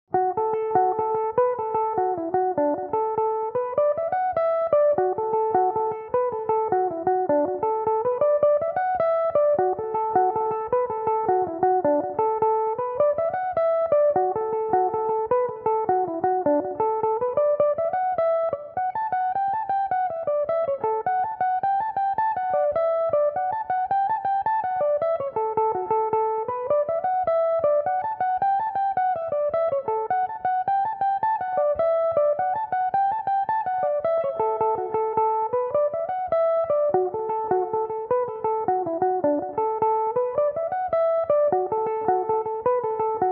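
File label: madcap jig d major, mandolin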